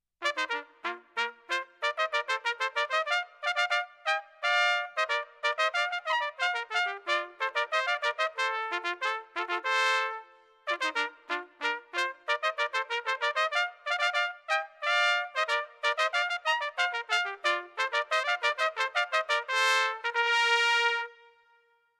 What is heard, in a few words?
Herald Trumpets - Rondeau